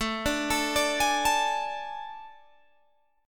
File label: A Major 7th Suspended 4th, Guitar, Open G